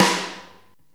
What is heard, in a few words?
SD HALLRESON, snare drum; SNAREDRUMS